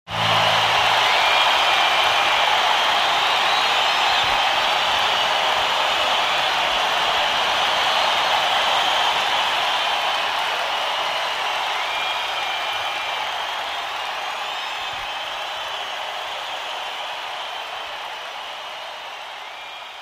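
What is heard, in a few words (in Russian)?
большая толпа возмущение редко аплодисменты; Bolshaya tolpa vozmushhenie redko aplodismenty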